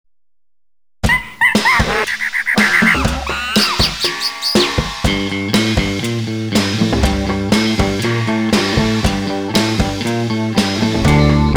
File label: Monkey Everywhere Karaoke